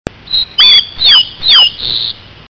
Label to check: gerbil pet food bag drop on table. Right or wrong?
Wrong